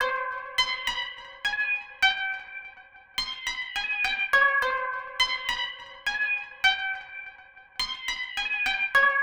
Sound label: Synth C, 104 Cmin; Hip Hop Exotica; Big Fish Audio